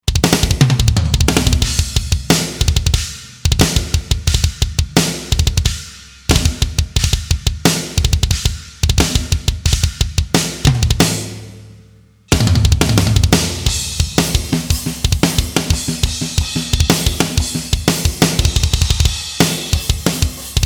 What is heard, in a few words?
Drums example with verb; ashkelon basher kit